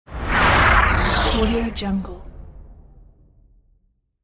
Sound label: sci fi passing by